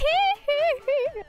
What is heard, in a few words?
hee hee